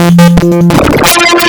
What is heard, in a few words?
NES, wavetables; waveedit256